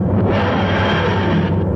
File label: flight loop